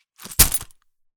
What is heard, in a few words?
Coin Purse; game inn